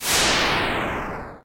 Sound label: ice block melt; watertowerclassic